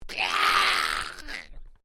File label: Created Creature Small Cry, Alien Sound Effects